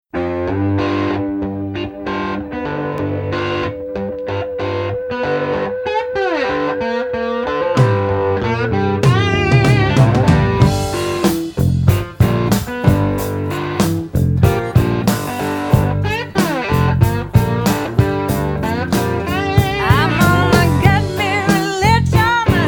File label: blues roots